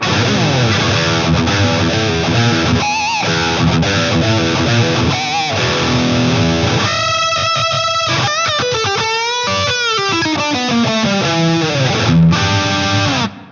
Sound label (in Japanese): wah tone 原音